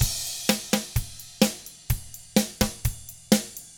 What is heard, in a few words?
Double Platinum Drums, surf rock; ILIO; Double Platinum Drums 1